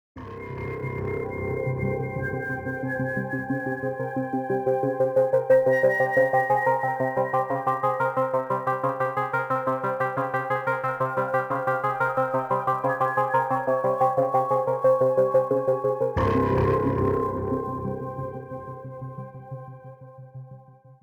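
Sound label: Day Ambience Stinger; dying light gamerip 2015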